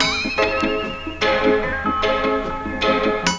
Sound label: ORGAN LP; ORGANS; Ragga & Dub Reggae